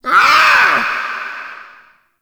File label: Ambient Meow Far; Characters; Warframe; Kalymos